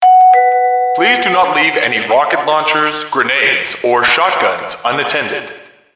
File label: subway